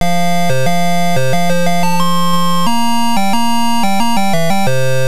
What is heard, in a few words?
Game Start; Mario Bros; Atari 5200